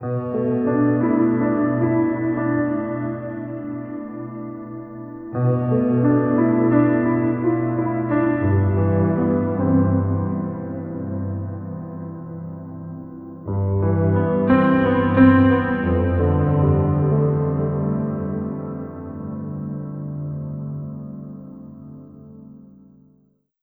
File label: Piano FX; Sonic Foundry (Sony Creative Software); Slow Silhouette